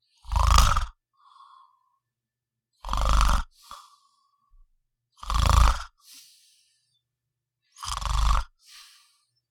Man snoring short inhales and grunts

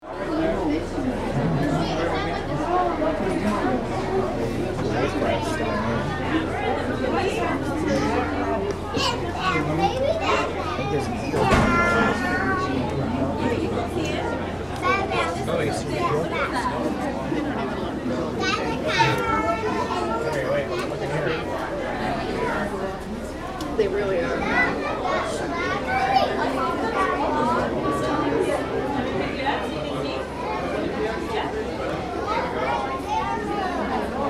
Museum ambience active kids and adults

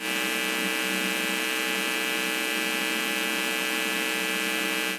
Television static noise

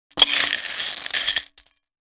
Breakfast cereal pouring in a glass bowl

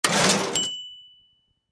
Cash register open ding; shopping

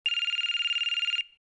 Mobile phone ringing x1

